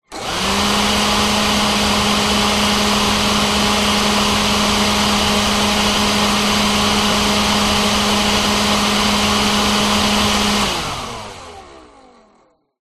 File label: industrial leaf blower electric on run off close up short burst